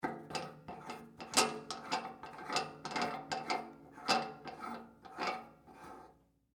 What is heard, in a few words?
Spigot Open, Turn, Handle, Metal, Tank, Water, Heater; Laundry; BlastWave